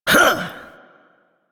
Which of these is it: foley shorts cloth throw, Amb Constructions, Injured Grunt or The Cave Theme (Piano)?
Injured Grunt